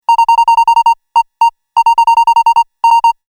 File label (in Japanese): 文字送り 長; ボタン・システム